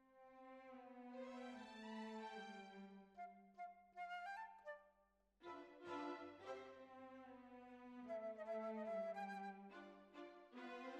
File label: Oboe